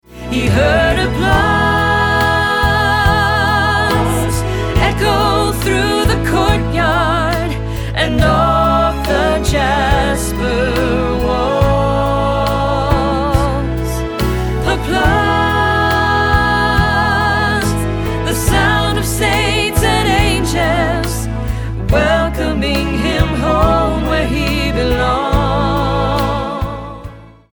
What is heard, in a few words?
Applause